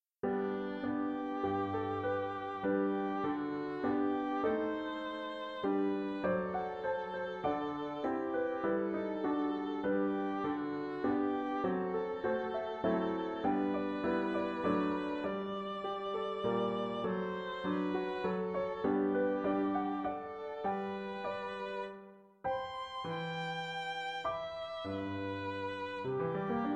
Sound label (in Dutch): Duet voor viool en piano